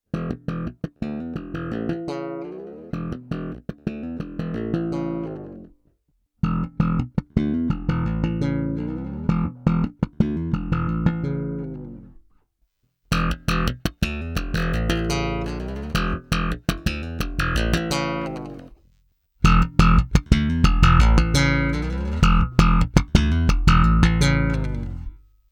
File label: funky div EQs